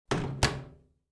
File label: microwave oven close; appliances